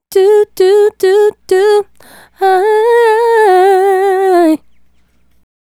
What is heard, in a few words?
Female Solo, Vocal; Sonic Foundry (Sony Creative Software); Vocal Toolbox